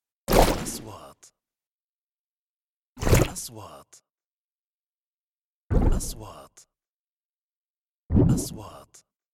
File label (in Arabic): نغمة اندفاع مائي سريع; transitions